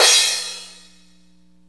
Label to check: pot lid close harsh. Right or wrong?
Wrong